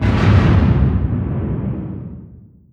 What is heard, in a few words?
distance heavy cruiser attack; Hearts of Iron IV Death or Dishonor